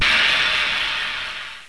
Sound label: steam